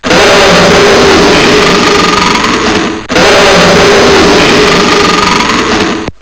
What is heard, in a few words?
lion